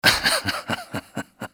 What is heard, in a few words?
laugh; Soldier Of Fortune II Double Helix; XBOX; scientist